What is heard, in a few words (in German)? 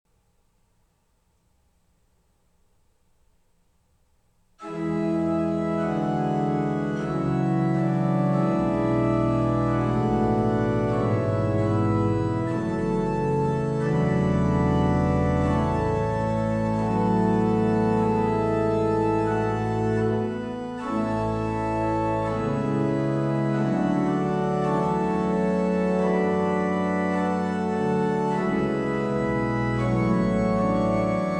Choral, Orgel; Veni creator